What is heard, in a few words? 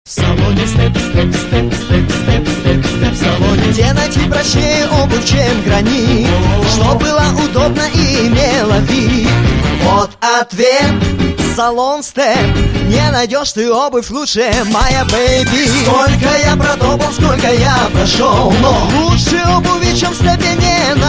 step song